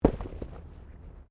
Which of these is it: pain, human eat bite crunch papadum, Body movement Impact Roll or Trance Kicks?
Body movement Impact Roll